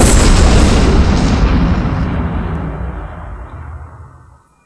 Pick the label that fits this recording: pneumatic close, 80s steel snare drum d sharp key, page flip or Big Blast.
Big Blast